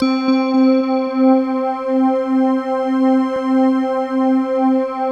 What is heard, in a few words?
BELL C, Slow Bell Choir; Optical Media International - Sonic Images Library